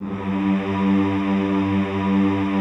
G1, Classical Choir; Best Service; Extended Classical Choir

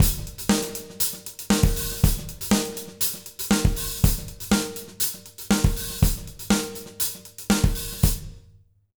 120 JROCK, Drumloops

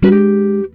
GTR CHORD; Univers Sons; Jazzistic

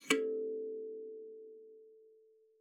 KALIMBA A4; Xsample VOL-7

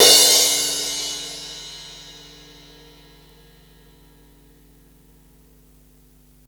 CYMBALS; AMG Gota Yashiki Groove Activator

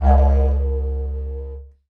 Didgeridoo Kit Samples

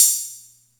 Music Percussion Computer HH open, Drum Machines

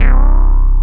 SUP BASS; Syntec; Wall of Sounds

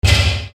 Percussion, EuroDance

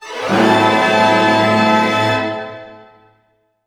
GLISS D# MIN; Sound On Sound - Peter Siedleczek's Orchestra

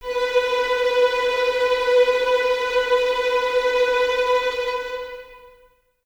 VS B 4 Tremolo, 23 Violins; Miroslav Vitous

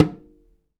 African Perc; Spectrasonics; Supreme Beats; African Contemporary; SB Afro DRMs

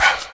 Fx Vox; Equipped Music; Loungin House

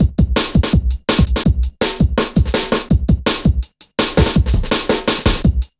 Programmed Stepz, Jungle Drum Loops

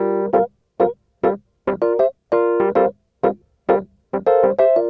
Smooth Groove Rhodes, 70s Analog Funk

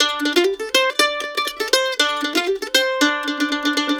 LICK, Blues Guitar; ILIO; Fretworks - Blues Guitar Samples; 120 CEE LICK

